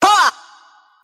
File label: "ha", Voice